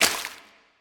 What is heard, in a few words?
defeat splash; smite; khepri